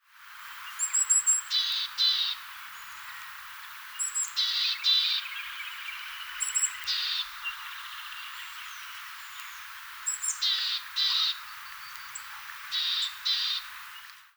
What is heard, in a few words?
Parus montanus